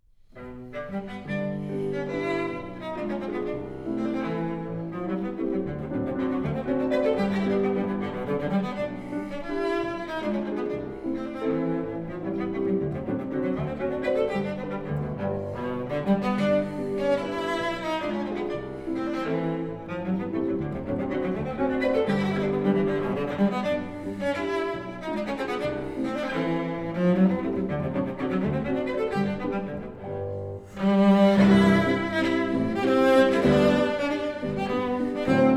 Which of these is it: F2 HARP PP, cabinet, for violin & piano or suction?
for violin & piano